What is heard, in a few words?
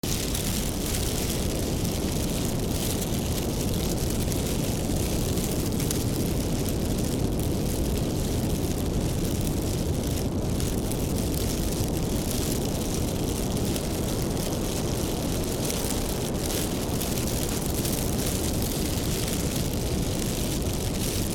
fire